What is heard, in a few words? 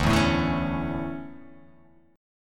D Major 7th Suspended 2nd